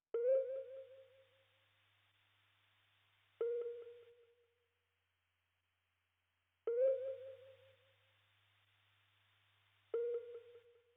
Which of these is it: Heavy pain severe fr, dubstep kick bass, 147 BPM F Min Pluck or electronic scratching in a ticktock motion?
147 BPM F Min Pluck